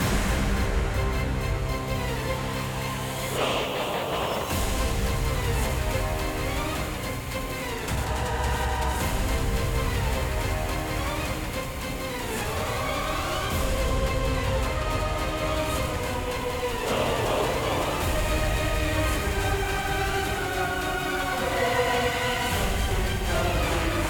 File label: Battle Boss Huge Statue